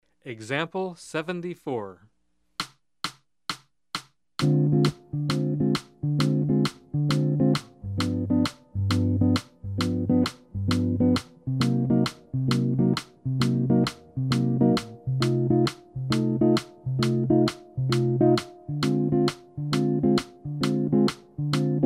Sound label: Jazz; jue shi ji ta